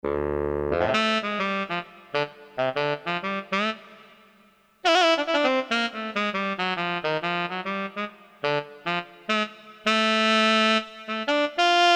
TENOR SAX, Korg DSS-1

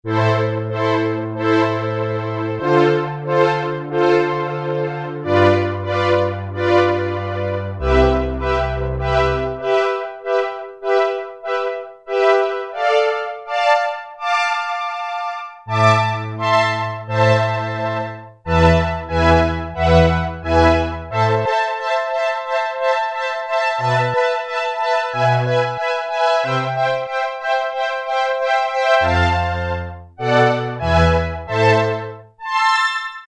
Bowing Strings; Factory Presets Bank B

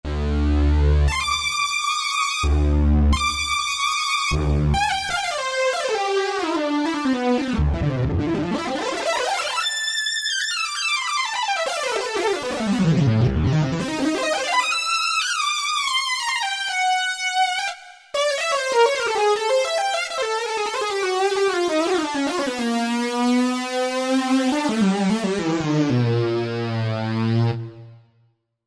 Mono Synth Lead, Korg Wavestation A-D

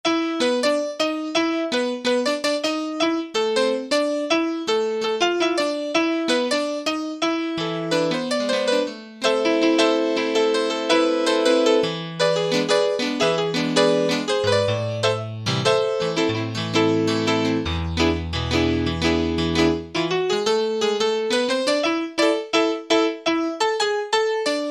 Salsa Piano; Alesis Z7 Latin

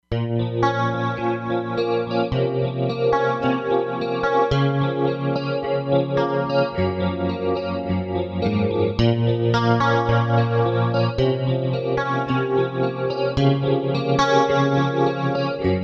Twin Strats, synth

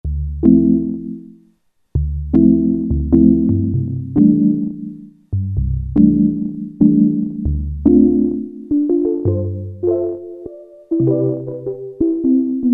Electric Piano, Korg PolySix; Factory Presets Bank B

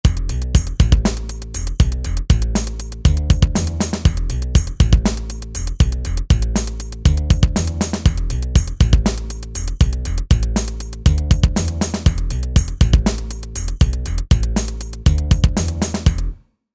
Drum Bass

